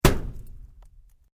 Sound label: METAL CORRUGATED IRON PIPE LARGE STRIKE